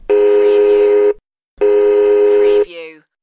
Toy Train Hoot

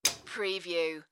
Quick Kiss; Sounds of kisses and kissing sound effects.

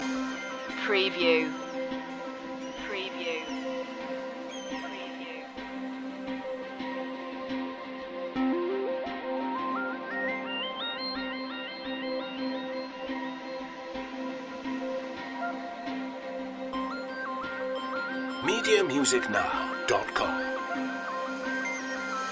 at the seaside loop light seaside fx

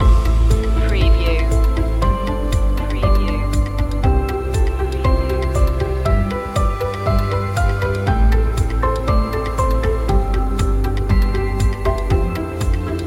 simply joyful

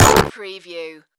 electronic mini glitch

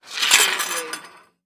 Sword In Sheath

